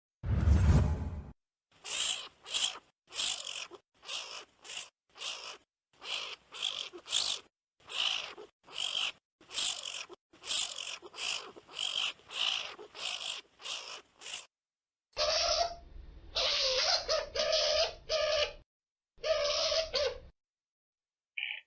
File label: Skunk Sound